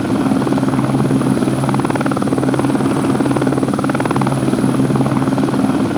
heli loop; UI; Splashdown; XBOX

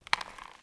Cage Handle; Myst III Exile

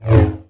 saber spin; weapons

